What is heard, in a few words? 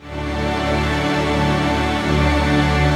d string